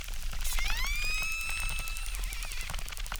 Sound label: Chirp zap, acquarium